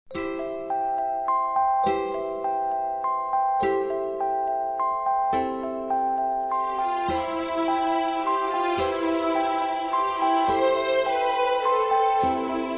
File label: Orchestra, Strings; Majesty Orchestra